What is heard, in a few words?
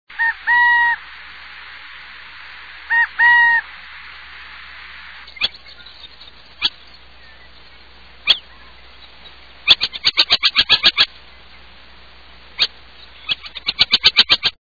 Burrowing Owl